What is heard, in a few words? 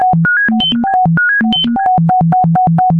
win; blockly-games; movie